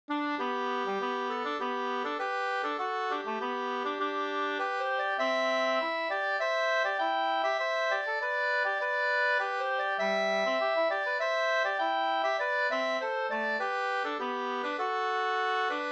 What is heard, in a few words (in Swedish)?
brudmarsch; fr Valdres